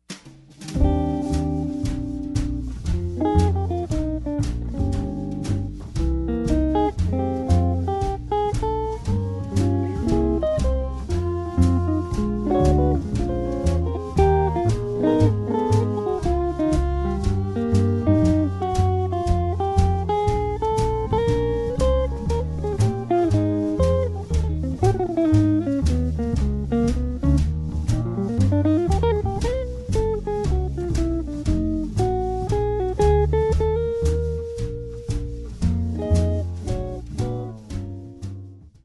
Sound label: dinner cocktail instrumentals